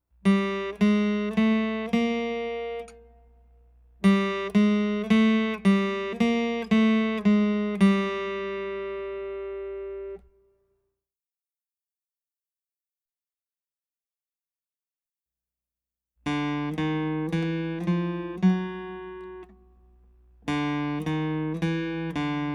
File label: Flats 3rd And 4th Strings